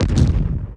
missle impact; Eagle Strike v1.01

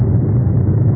rumbling volcano; bump n burn

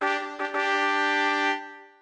trump

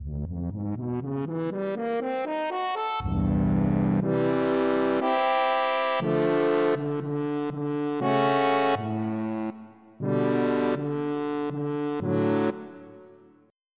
French Horn; FF7

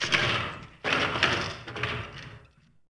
TC DOOR, door abandoned; PC Gamer IT CD 40; zones

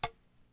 Staff Tap; Civilization4

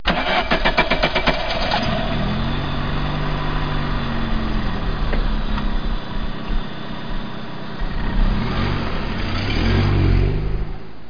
car star; Sound Effects Library 1.5

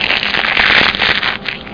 pickle eat

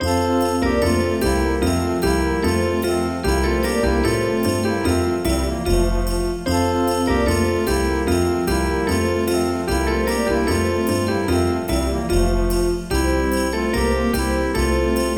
MELODY; Funny

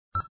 high Beep Sound; darkmelt; Puzzle

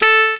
saxophone

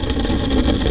SHIP SCRA

